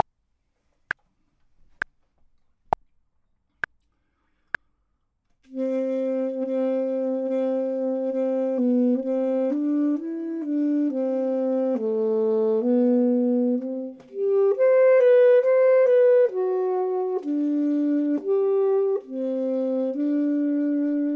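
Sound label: bottom, Saxophone and Clarinet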